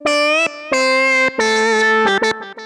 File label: SYNTH 90 A; Keys